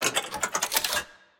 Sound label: Lock Pullout; Puzzle